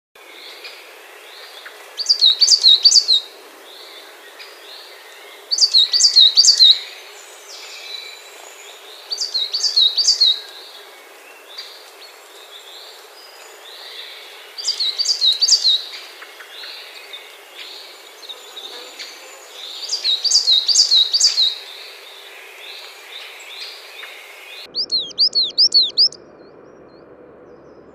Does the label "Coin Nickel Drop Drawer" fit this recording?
No